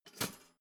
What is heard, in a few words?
toaster pull down hard